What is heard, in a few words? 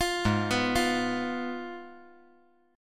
G sharp Minor 6th G sharp down; G#m6 arpeggiated; JGuitar